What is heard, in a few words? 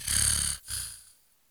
snore; frozen-bubble